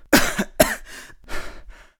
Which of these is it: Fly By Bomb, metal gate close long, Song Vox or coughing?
coughing